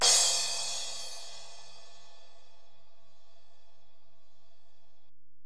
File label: Ride, Alesis DM-PRO